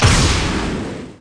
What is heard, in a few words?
high jump